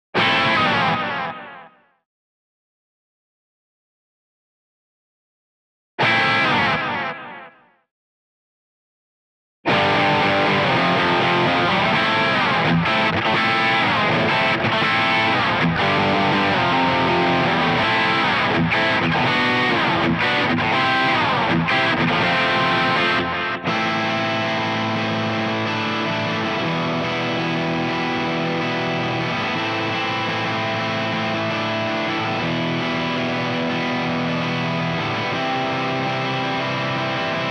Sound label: b gtr